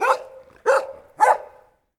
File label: dog distant; DeadFright